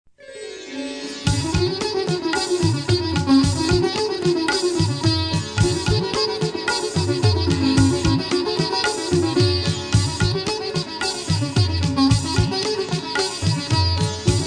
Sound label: sit harmony; fusion of rock and Indian music